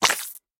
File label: death; vex7